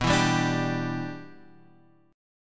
E Minor 7th B

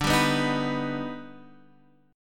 D sharp Augmented Major 7th D, chord

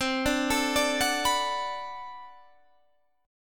B Minor 7th C, chord

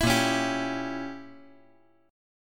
A sharp Diminished 7th, chord

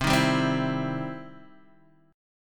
E Minor Major 7th Sharp 5th, C

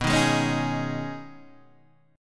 B 6th Add 9th B Guitar DADGAD, chord

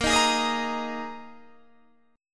A sharp 7th Suspended 4th down Guitar DADGAD

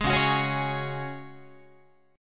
C sharp Suspended 4th C sharp Guitar, chord; strummed; JGuitar

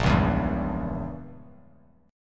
A sharp Diminished 7th, Bass Standard; strummed; JGuitar

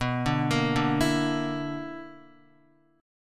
B-Minor Major 11th, Guitar; BmM11; arpeggiated; x,2,2,3,2,0; JGuitar

